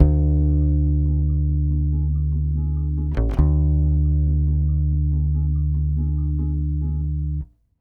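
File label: 140 bass Dm11, brazil bossa; USB Soundscan; AKAI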